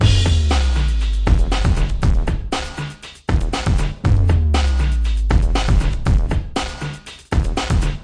119 bpm, bigbeat crashbound, drum loops and breaks